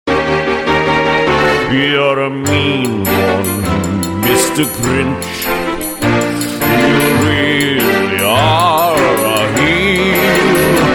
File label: english song ringtone